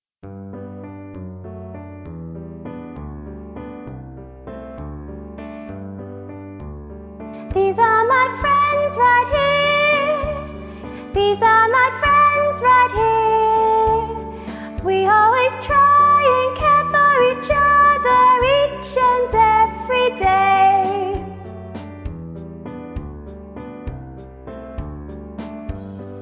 Live unison song for treble voices

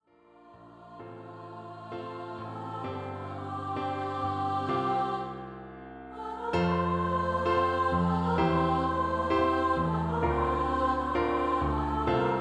2 part song for Treble Voices

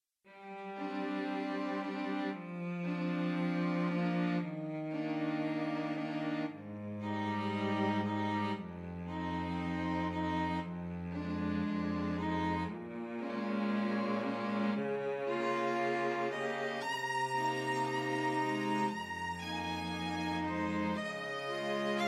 Pledge for string quartet

